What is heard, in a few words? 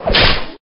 slash